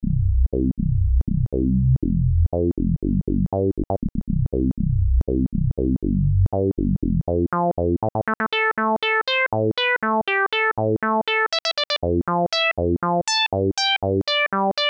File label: novation v-station vsti filter demo lpf24